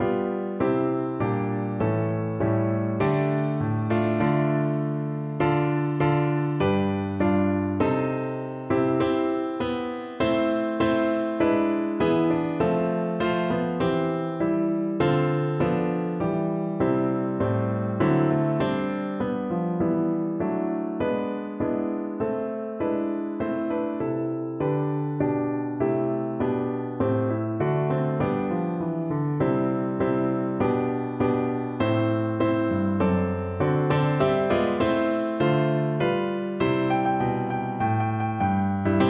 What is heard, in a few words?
jamaica, piano